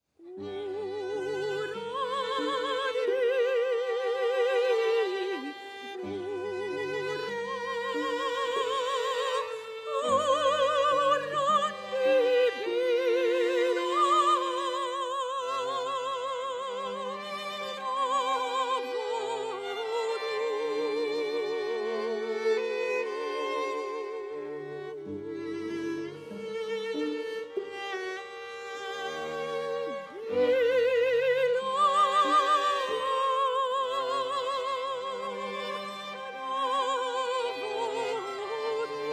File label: Mokranjac sopran